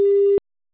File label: Busy Tone, IP Phones; Cisco; IOS; 7942G; English